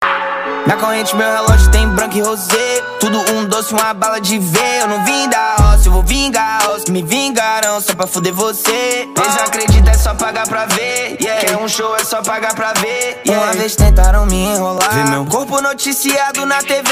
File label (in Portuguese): musica